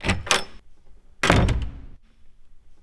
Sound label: Heavy Door open-close